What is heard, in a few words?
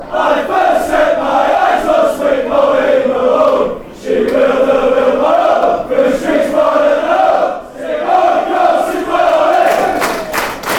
bolton chant